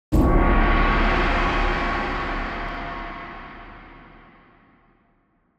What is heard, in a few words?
The Gong; Orbital Emitter; Cinematic Transitions for Editors Volume 2